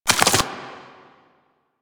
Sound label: Proto Infested Maggot Step; Warframe